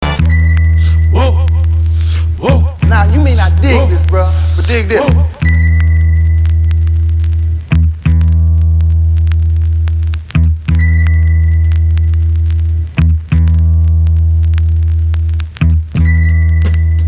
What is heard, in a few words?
instrumental